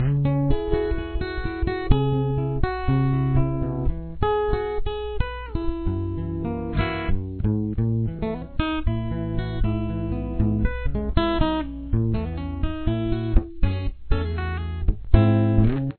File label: chorus, guitar